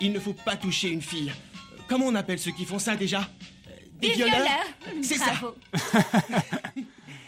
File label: hahaha